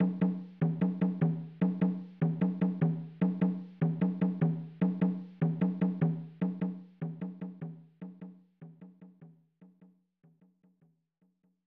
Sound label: Jungle Rhythm; Pitfall The Mayan Adventure; SNES